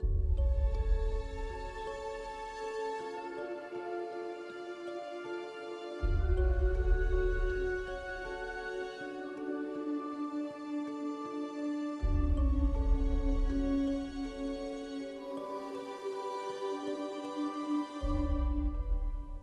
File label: BGLoop; Frankenweenie